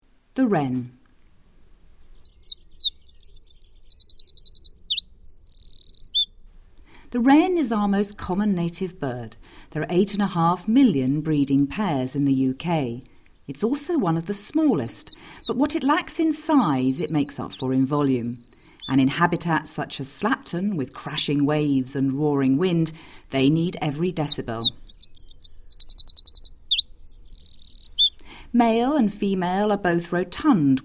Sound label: Wren